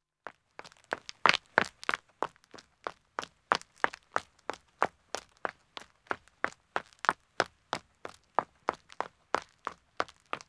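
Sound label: Country Road Running man start; Footsteps; BBC Sound Effects Library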